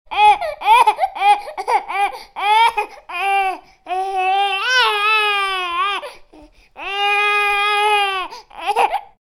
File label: Baby Whine